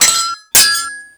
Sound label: SWHIT, SWORDHIT; Total Control - Action Cutz